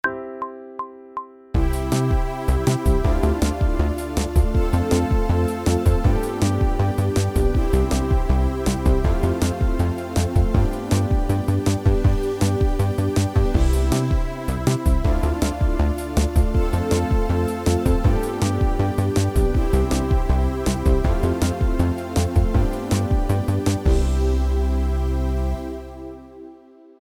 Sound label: Technical Work Riffs C major backing track, pop vocals; Grade 1